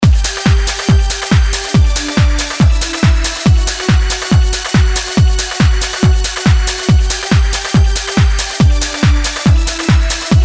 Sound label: FX Strings